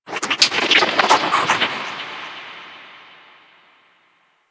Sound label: Cephalon Tech Texture Medium, Ambience